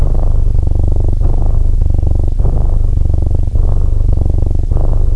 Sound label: purrrr